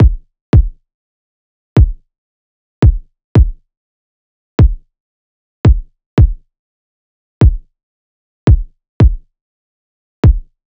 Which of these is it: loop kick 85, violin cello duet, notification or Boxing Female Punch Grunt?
loop kick 85